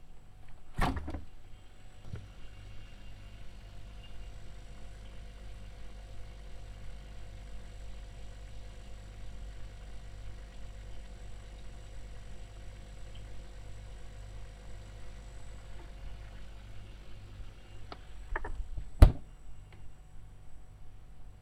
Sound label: refridgerator